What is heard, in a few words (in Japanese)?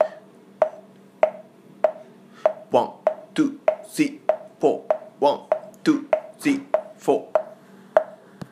裏クリック